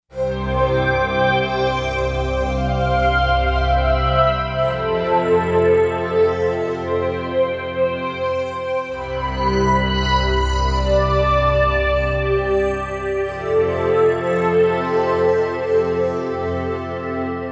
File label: Metal Sweep; Roland SC-880; Factory Patches